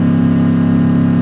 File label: Truck Explorer Loop; Trucks